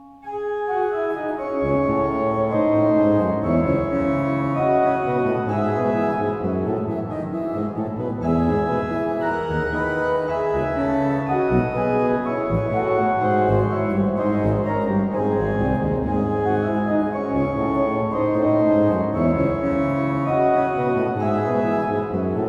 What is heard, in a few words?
Partita, organ; Partita -O Gott du frommer Gott-, BWV 767- VI. Partita VI